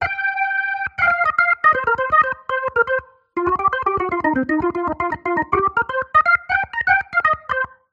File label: Rotary Speaker Rotary Driven Organ Dry; AmpVibe; Syntheway